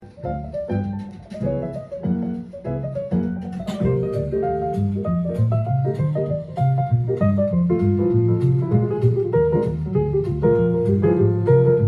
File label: Piano Jazz